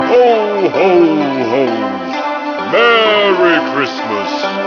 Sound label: hohoho; ringtones